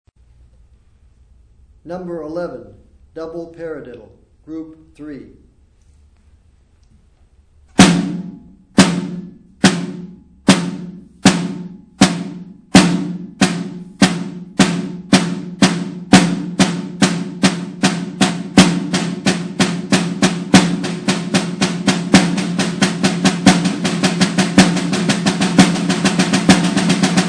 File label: snare rudiment